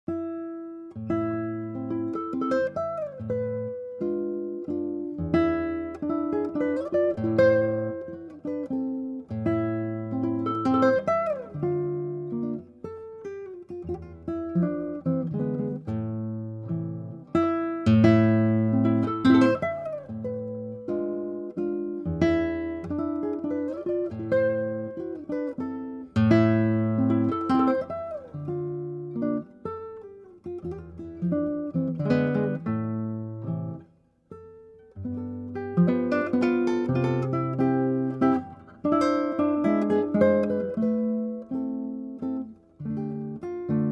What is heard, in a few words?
Mazurka Choro